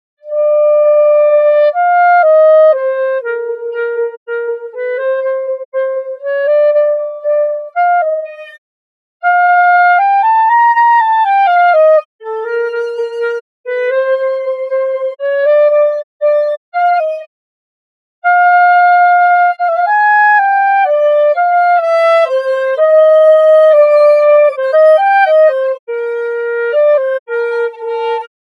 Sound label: solo